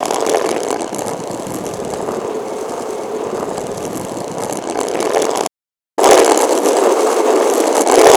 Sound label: Resynth Stone Roll